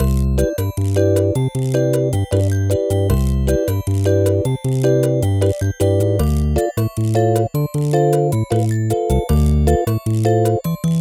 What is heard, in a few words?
Bossa (Shop); stardust galaxy warriors stellar climax